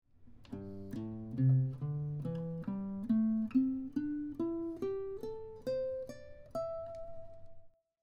Connecting shape 1, A minor pentatonic scale, guitar; Minor pentatonic scale connecting shape 1 with tabs example